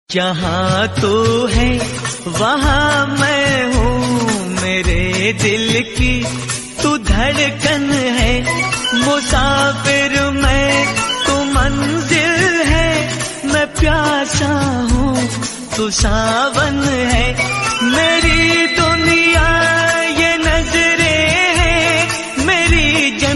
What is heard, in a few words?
old song ringtone old is gold ringtone hindi old song ringtone